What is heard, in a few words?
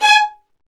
STR, String Sections, Violins Marc; Roland LCDP13; Vls Marc4 x8